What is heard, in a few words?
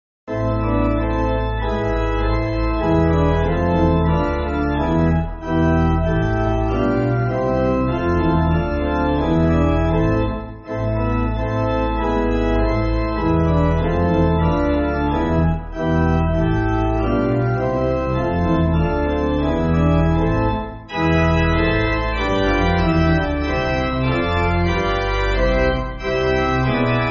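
Pipe Organ, church backing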